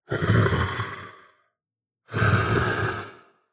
Dragon See

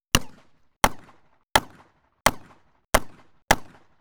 Black Powder, Gunshots

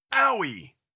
damage grunt male

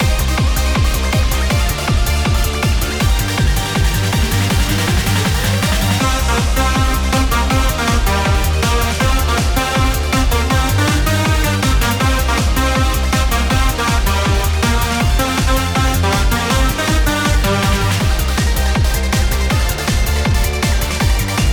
neon sign circuit climax remake bpm160

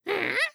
Minion Bombed

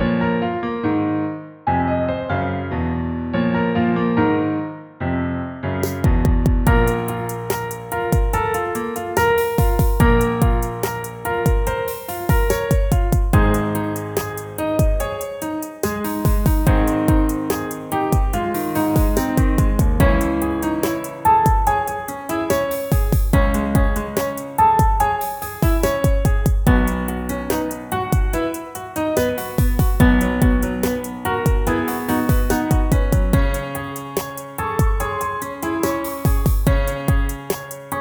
quarry piano

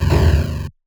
explosion 8bit

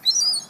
Seagull Hit; Solo Seagull

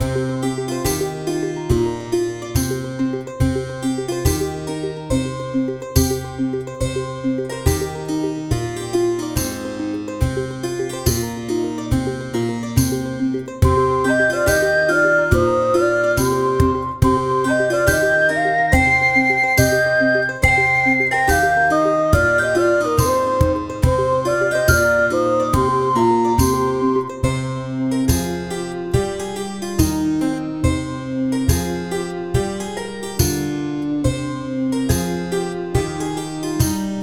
jam menu music loopable

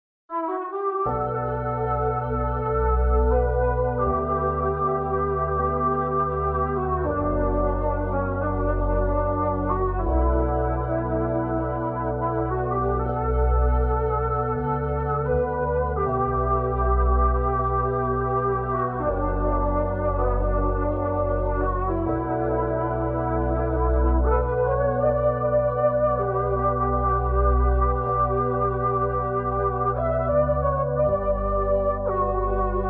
Velvet Sax, Synthgaze

